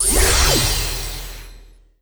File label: Enemy Glassy Common Appear; Enemy Ufo block Common Appear